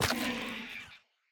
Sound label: Sculk Sensor break